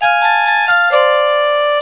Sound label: open printer